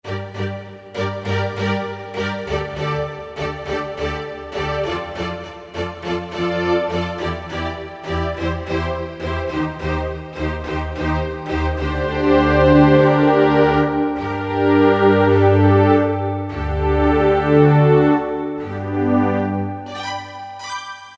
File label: Arco Strings; Factory preset demos